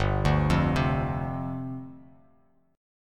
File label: G 7th Suspended 4th G down; G7sus4 arpeggiated